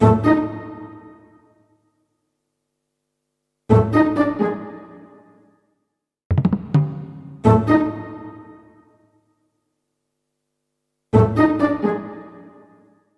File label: Music Game Haunting